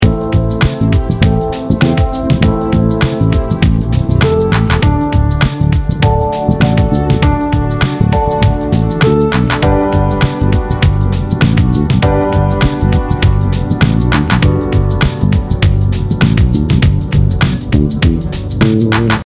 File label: 251 G major, ii–V–I (Ami7–D7–G) chord progression in G; Here’s a ii–V–I (Ami7–D7–G) chord progression in G; bass; chord substitutions; a bassist's guide to chord substitutions part 2